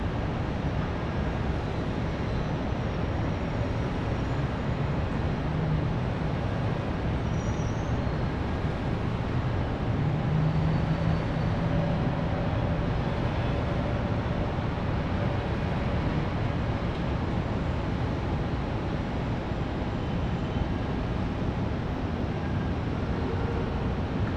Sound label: City Traffic Distant; Ambience